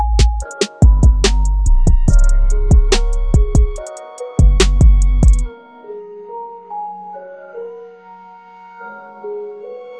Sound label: big beat; musiclm